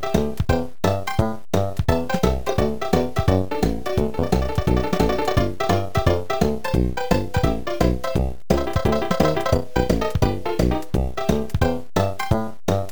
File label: Level1; smw; smw1.7